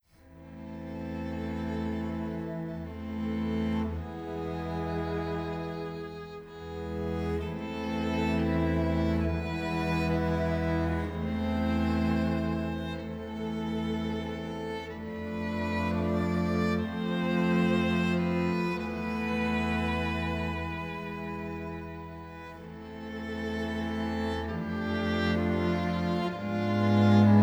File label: String Quintet, Chamber Music